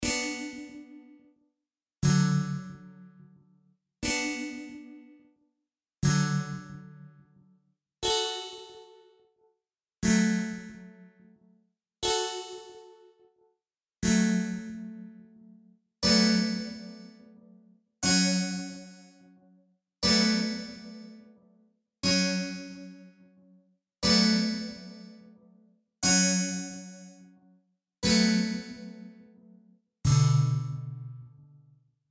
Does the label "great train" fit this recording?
No